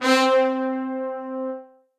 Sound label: Brass Ensemble Sforzando; Ableton; Orchestral Brass